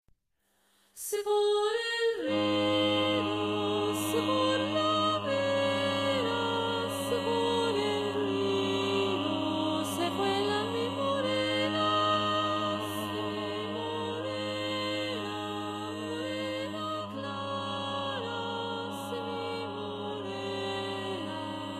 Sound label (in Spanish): 4 voces; Luisa vareadores